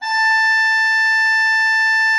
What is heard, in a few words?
A5, Cathedral Organ, HOLZPRINZ.; Propeller Island